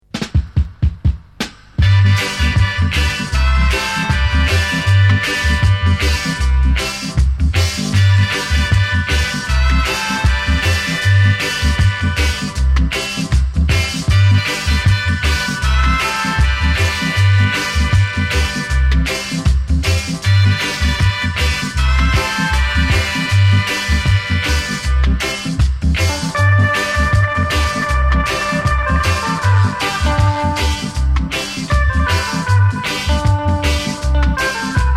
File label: Pressure Sounds